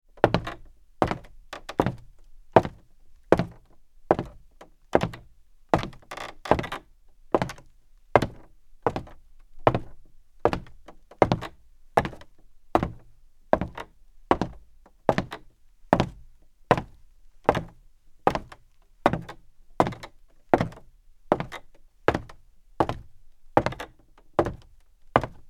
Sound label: Footsteps Hard Wood Walk